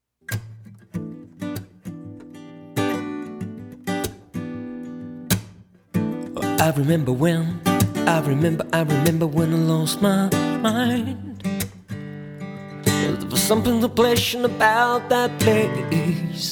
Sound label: crazy solo